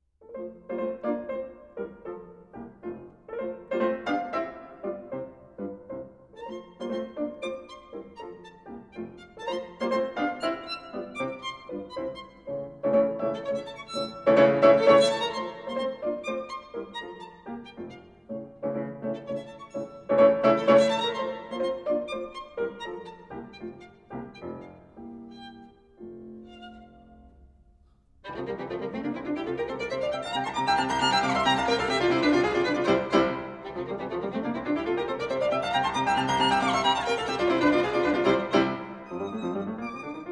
Beethoven Violin Sonata 5 In F, Op. 24, 'Spring' - 3. Scherzo